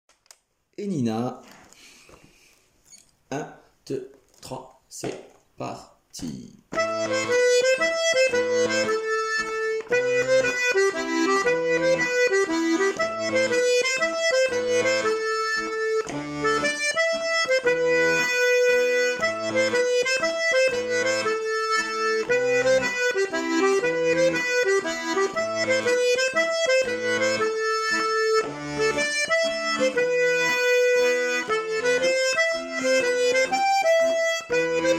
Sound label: Mazurka; Nina